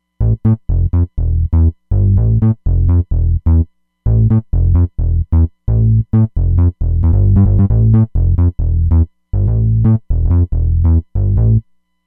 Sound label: Synth Bassic